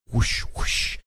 making whooshing sounds

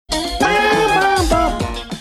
singing along with theme; night court

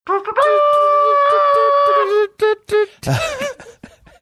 singing funny fanfare

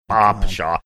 awpsshaw

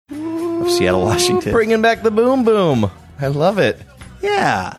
mmmmmm bringing back the boom boom

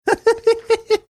cute laugh

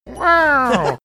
meowing

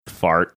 fart

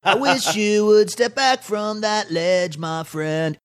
sings

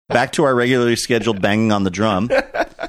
banging drum

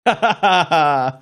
interesting laugh

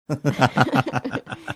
chuckling

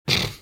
snorting

